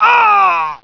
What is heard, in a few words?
pain; otis